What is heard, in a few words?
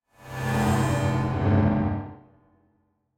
Distortion Left To Right; Distortion Whoosh Right To Left To Right